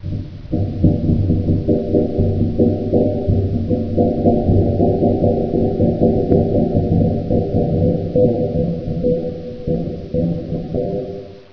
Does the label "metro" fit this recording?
No